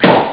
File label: kraftsdrum; ST-XX Amiga Soundtracker Sample Packs